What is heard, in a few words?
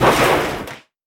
damage vehicle; World Of Warships